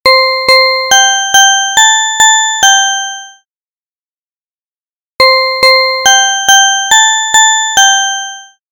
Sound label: twinkle, tones; Clock